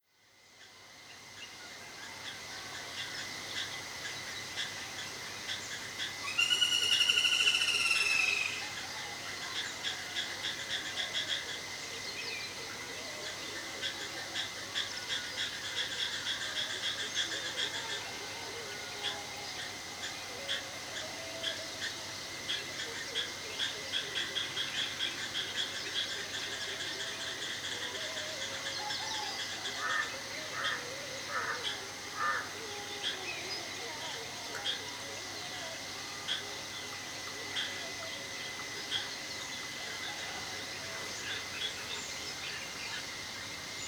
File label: Forest frogs insects birds the morning, South India; Charlie Atanasyan; Faunethic